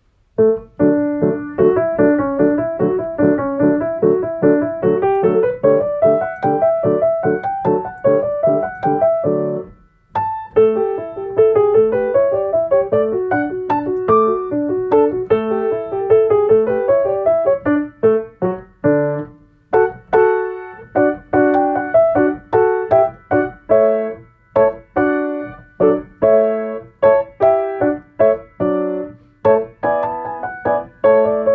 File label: German Dances, for Piano; Beethoven, Ludwig van; 12 German Dances, WoO 13